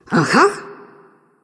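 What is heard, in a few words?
nonverbal agree; smite; ratatoskr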